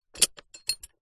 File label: horse saddle horse stirrup iron metal foot in movement exaggerated; animals